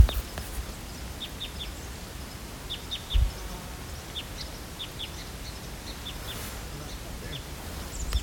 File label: Red Crossbill, Loxia curvirostra benti; finches